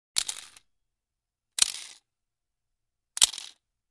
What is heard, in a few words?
9mm Pistol, foley gun movement